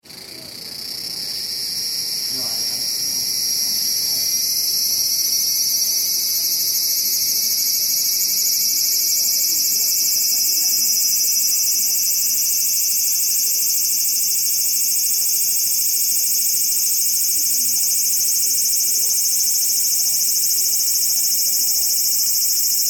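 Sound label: Insect Call Cicada Sci Fi Sounding Long Tokyo Japan Cemetery; Strange insect song, crickets / ci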